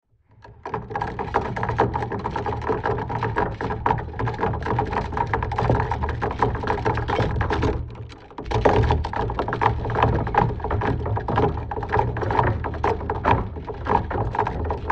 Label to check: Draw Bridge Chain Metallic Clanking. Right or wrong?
Right